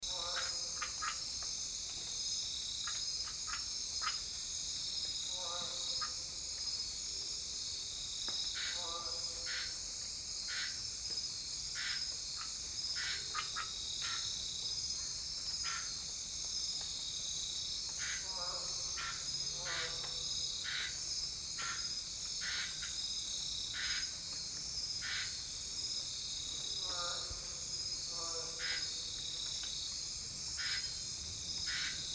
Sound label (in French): rainette des maisons rainette Kunawalu, Guyane